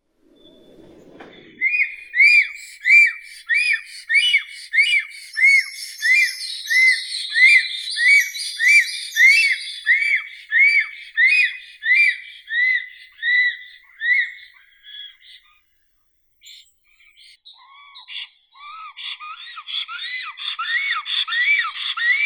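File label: bird voices, black stork, Ciconia nigra; aist chernii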